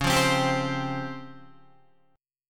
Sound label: Db Major Sharp 11th, Guitar; strummed; JGuitar